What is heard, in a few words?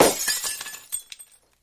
Glass Break Generic